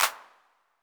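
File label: 808 clap, TR-808; Crypton - DrumBase5000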